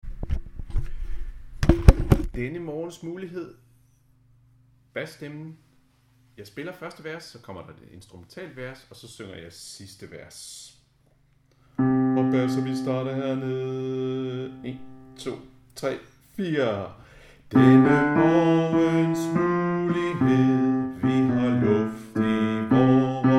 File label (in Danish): basstemmen